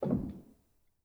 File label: rel, Grand; bitKlavier; Binaural